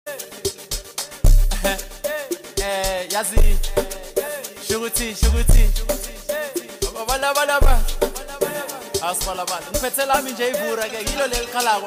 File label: Amapiano Melodies